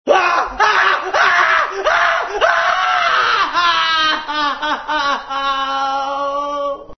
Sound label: hahaha; cstrike